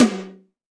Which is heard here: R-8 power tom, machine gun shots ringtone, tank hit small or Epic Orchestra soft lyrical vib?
R-8 power tom